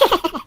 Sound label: laugh; roblox